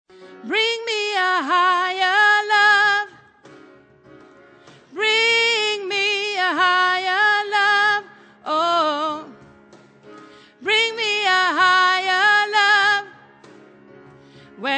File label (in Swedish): sopran